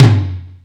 DRM G3, YAMAHA R5+RX; Best Service ProSamples vol.55 - Retro Sampler; AKAI

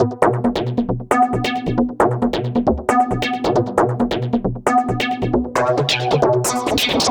Arpeggiator, EDM; Sonic Foundry (Sony Creative Software); Afterhours EDM

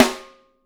WOOD, Living Drums; 6X12

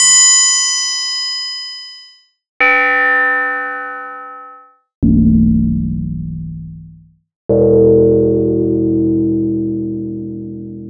Prophet-5 FX; SCI; polyend

